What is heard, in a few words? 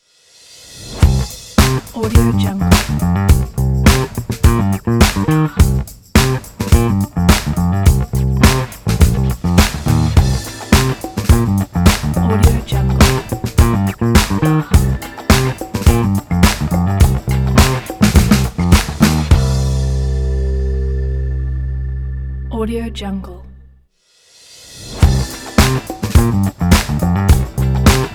fresh bass funk logo